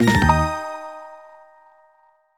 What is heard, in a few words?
Sting; active health with carol vorderman; nds